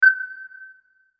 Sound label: Radar Appear